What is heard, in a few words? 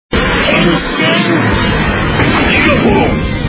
transform